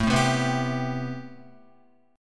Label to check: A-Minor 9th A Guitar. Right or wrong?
Right